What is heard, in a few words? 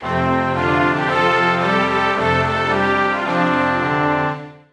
South Africa, Fanfare; 1997 MMX LAN PACK (win 95); Worms Armageddon